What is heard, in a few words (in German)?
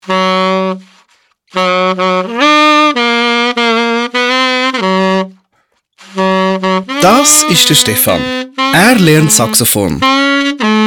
Saxophon